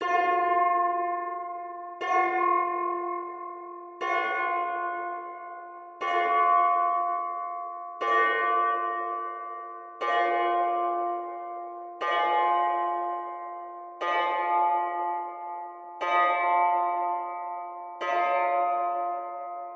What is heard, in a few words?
harp shift